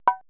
PERC MIX

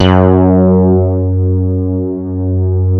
SH 1000, BASSES

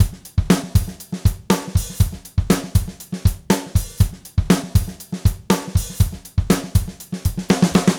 Gretsch groove 120 BPM, 1967 Gretsch Kit drums

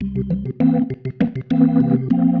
Single Riff, Organ

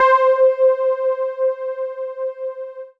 Dance Floor Bass, Multisample Basses

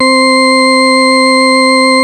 organ 5 C5, Fairlight CMI IIe, keyboards

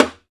muted rim Timbales; E-MU Producer Series Vol. 2 – More Studio Essentials; Composer; Timbales Xswitch

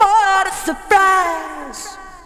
VOX SUNG; Megabass Remix! 2

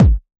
OSH Kick

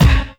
BD, Drum & Bass, 150 BPM; Ueberschall; Drum & Bass Resonance

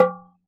oxaca, percussion; ILIO; Synclavier Percussion 2; World & Orchestral

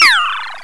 SciFi; Urban Shakedown

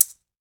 MEXICAN, Latin Shakers

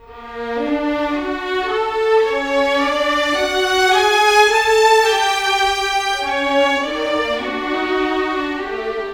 Cinematic Viola Violins; Orchestral; Sonic Foundry (Sony Creative Software); Orchestral Series VOL-3 - Cinematic

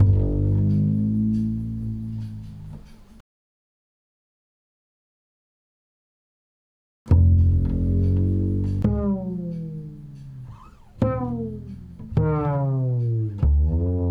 Vegas Bass-G, 136; Zero-G Kocktail Kollection; Construction Kitz (Lounge); Vegas Time 136

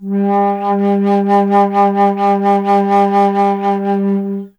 Alto Flute, Solo Instruments

